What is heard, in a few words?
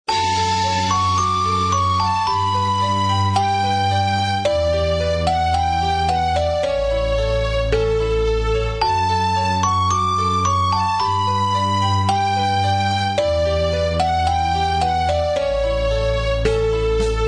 Under the hot sun 110 BPM